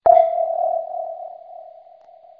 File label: sonar